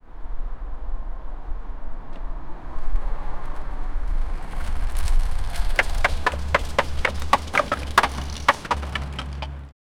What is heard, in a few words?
building with dirt, block; steve